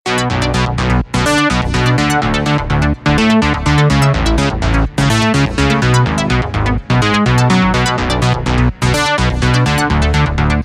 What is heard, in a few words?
bass